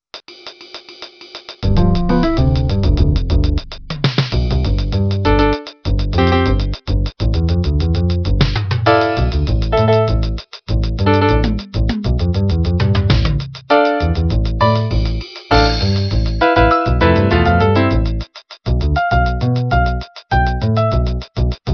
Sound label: disharmonic rhythms